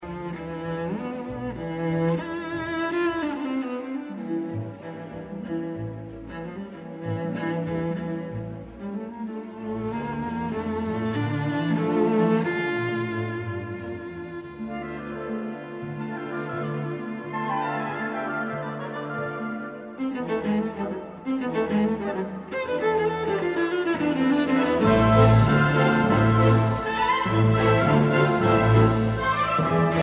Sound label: Cello Audio